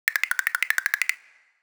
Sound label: Tiptoe, Classic SFX, cartoon; musicradar; cartoon caper